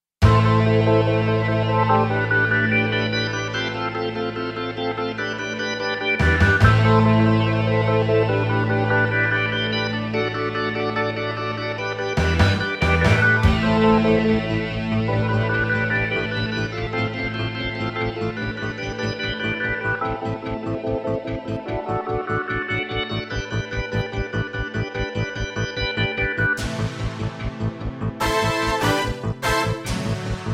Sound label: K2661 Contemporary